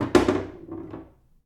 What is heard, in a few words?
Foosball Goal Score